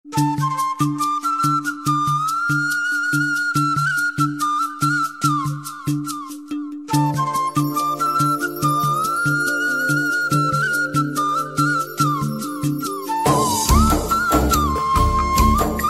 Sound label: flute ringtone flute bgm; movie flute bgm; pournami movie